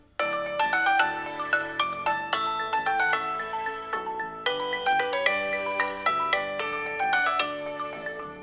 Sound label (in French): son telephone tile